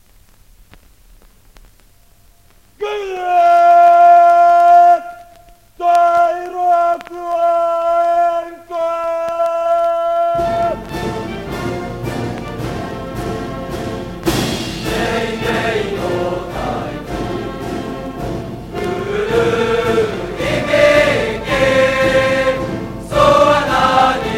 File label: cheering song